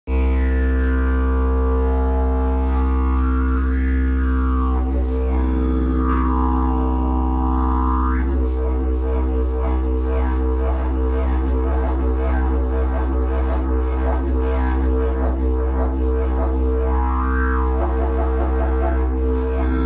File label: didgeridoo; jilm bleskoles